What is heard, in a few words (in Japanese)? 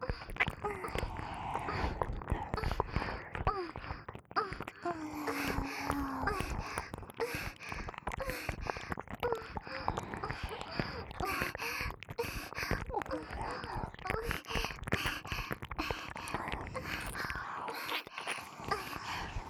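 作業喘ぎ＋両耳舐め; 美冬; 小春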